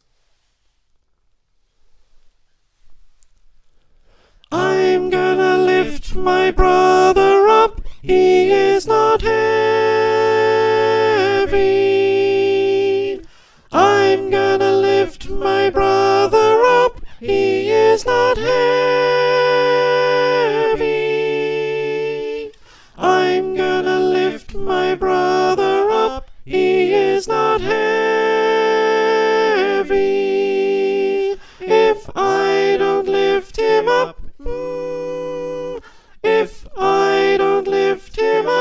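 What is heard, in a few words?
soprano, choir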